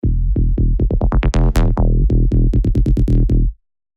Sub, techno bass; spire; Designer; revealed spire techno bass vol 1

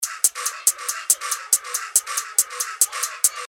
140 BPM, techno loops; Dorfkinder; revealed techno top loops vol 8

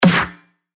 Snare; Frattboy; revealed claps snares vol 2

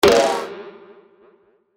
percussion; Gaslight; revealed percussion vol 2

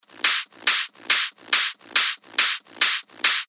techno loop, 140 BPM; Revealed Techno Top Loops Vol. 10; Ignite

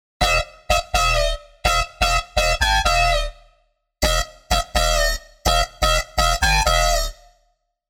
BS, bass tech house; Slug; revealed serum 2 bass tech house vol 1

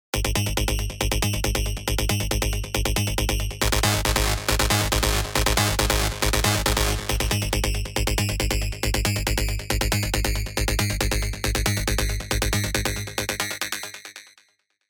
Bassline Bitz, Spire; alonso 19 year anniversary pack

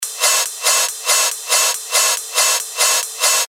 techno loop 140 BPM; Shuriken; Revealed Techno Top Loops Vol. 8

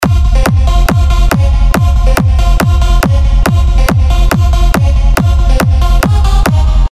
Rave Generator, big room techno drops; Revealed Serum 2 Big Room Techno Drops Vol. 1

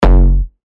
F#, bass shots; revealed bass shots vol 3; Terra

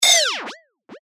FX Hat, cymbals; revealed cymbals vol 1

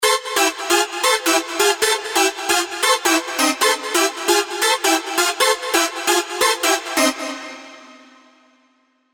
Rave Train, rave stabs; revealed; Spire

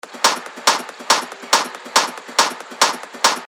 140 BPM, techno top loops; Axle; revealed techno top loops vol 8

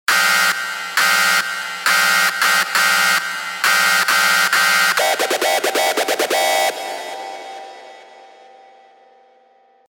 big room techno drops; Clanker Order 66; Revealed Serum 2 Big Room Techno Drops Vol. 1

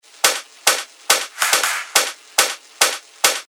techno loop 140 BPM; Starwire; revealed techno top loops vol 8

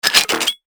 percussion; Latios; revealed percussion vol 2

